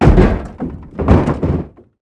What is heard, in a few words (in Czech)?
narazene autko; Mafie 1